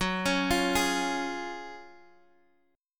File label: C sharp Major F sharp; arpeggiated; JGuitar